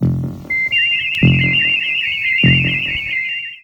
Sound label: sickbay sound; TOS